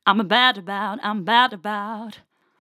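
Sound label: 122, G minor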